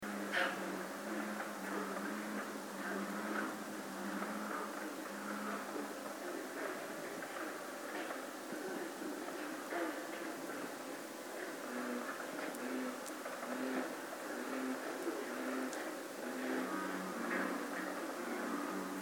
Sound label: Early AM Frog chorus West Pond